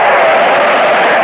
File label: ski; NewSurfs